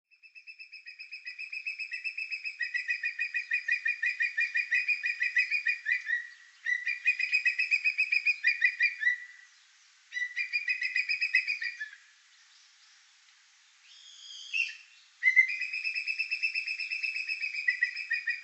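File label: Whimbrel; ishigaki; koe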